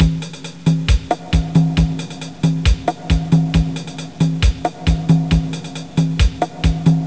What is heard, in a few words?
Rhumba, DRUMMACH; Leonardo The Inventor